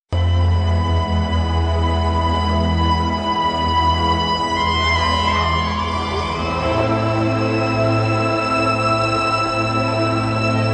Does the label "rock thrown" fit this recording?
No